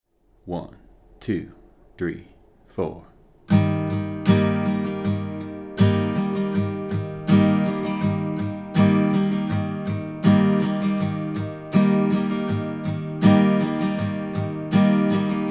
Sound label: strum pattern